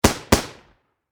Warfare Machine Gun M240B Double Shot Close Perspective